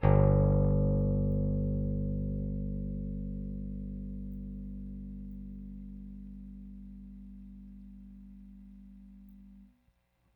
Rickenbacker Bass; Airwindows

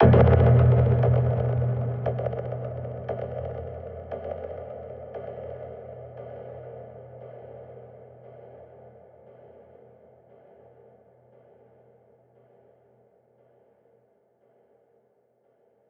ImpactFX; neuro; InsideInfo; FX and Weirdness

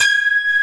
LINEAR SYNTH

